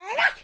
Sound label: grandma knife waaa; Blind Drive